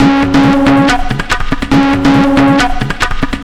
tabla, 140 bpm; Zero-G; Pure Tabla

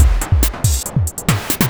Beat, Drums & Percussion; Electrocution; Beats

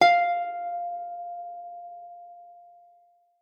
F5, GUITAR; Xsample VOL-2

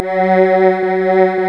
PPG CHOIR F#3; USB Soundscan vol.49 - Analog Fantasies; AKAI

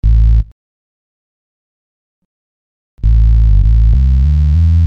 Big Buzz Bass, Hip-Hop; Construction Kit; Sonic Foundry (Sony Creative Software)

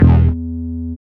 DR. LOOP, BASS; X-Static Goldmine 3

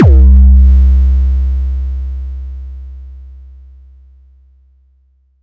boom, Kicks; ModelCycles

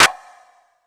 sfx perc

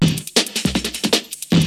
BREAKBEATS, TECHNO

